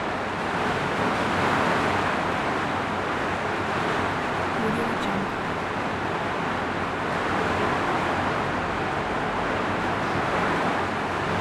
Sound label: heavy rain in old closed italian courtyard loop